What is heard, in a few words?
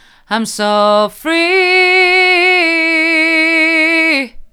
mid, vocal